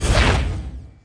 MISSILE; Team17; Phoenix Demo

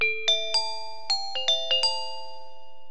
Glockenspiel

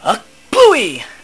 plooey; The Fishin' Hole

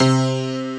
Honky Tonk Piano; cubic

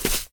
Grass; kult

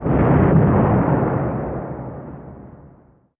boom; BESTSHOT; noisybit

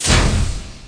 Click Out

